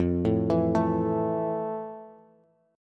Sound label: F Major 7th F, Bass; arpeggiated; JGuitar